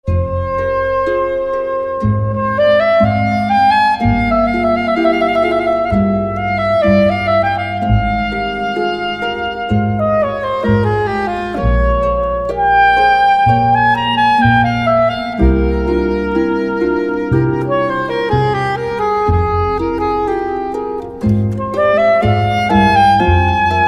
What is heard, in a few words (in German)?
sopransaxophon; weinen klagen sorgen zagen